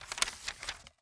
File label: Book Page Turn